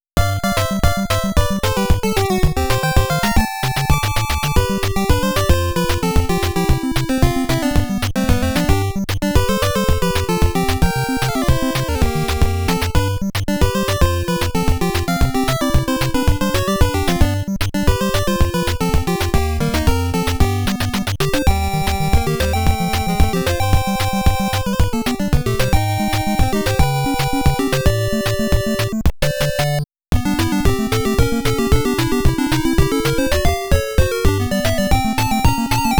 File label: Game Set BGM; konami famicom chronicle vol.1 disk system edition